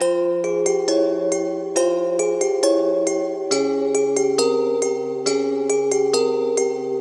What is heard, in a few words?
Dark Melody Loop - 137 BPM F Min Bell; Cymatics; Oracle Sample Pack